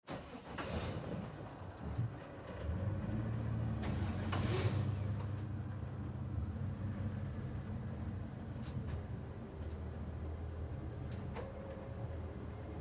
Boat Start Drive High Speed Turn Shut Off Open Water; Vehicle